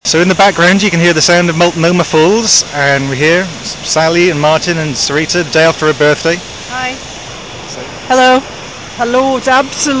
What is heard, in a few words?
waterfalling